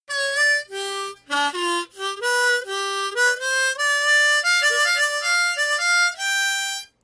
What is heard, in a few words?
Harmonica